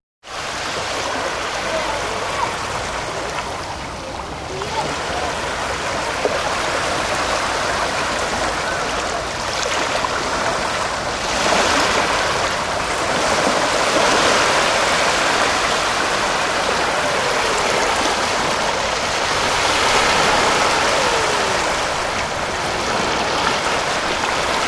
Seaside, Sound of waves lapping with background people.; Ocean (Sound Effects:Nature)